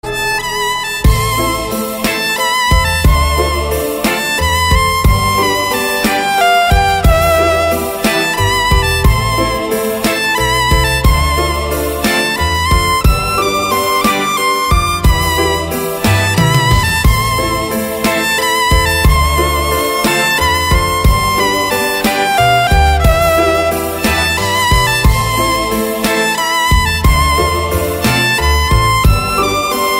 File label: Violin